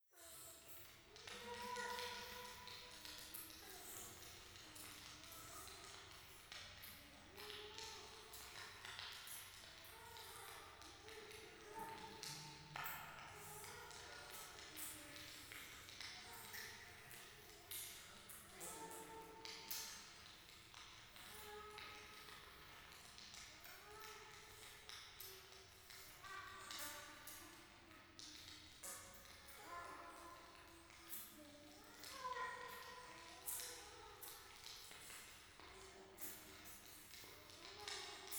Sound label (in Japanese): 教会 イラマチオ 暖炉